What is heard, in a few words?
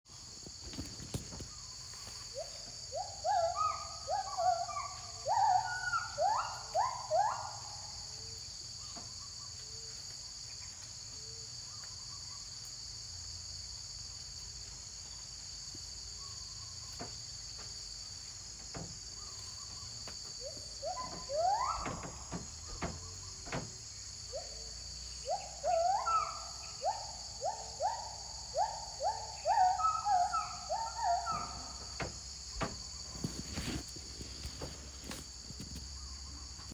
H. agilis male coda